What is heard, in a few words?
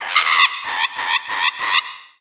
bird